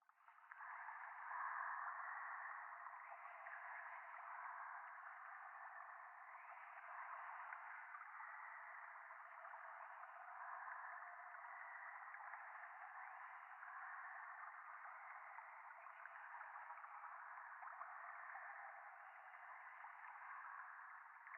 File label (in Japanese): ゴースト しこしこ 川のせせらぎ